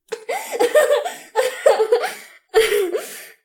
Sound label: giggles and laughter; SyrinScape